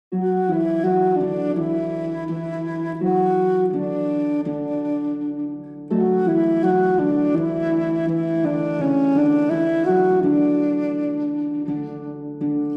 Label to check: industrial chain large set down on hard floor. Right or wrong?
Wrong